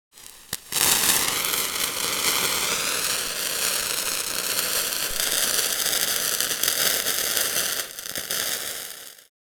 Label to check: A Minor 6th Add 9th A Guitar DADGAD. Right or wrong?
Wrong